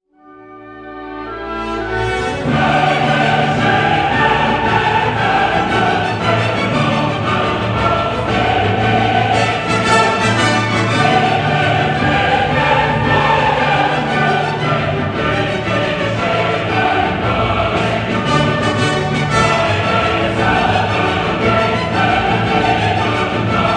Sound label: Chorus; Symphony No. 9, "Choral"; Beethoven